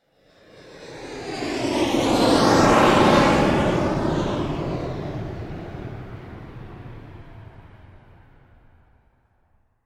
transportation aircraft military jet pass external, Fighter Jet Fly By; Airplanes (Sound Effects:Vehicles); McKinney Sound